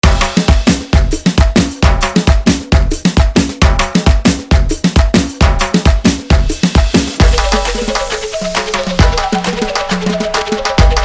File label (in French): Ambiance Saleg Party